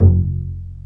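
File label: String, contrabass pizzicato; String Master Series; Cb Pizzicato; Cb Pizz 3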